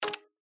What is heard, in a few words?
Spin Stop, SLOTS; Big Paul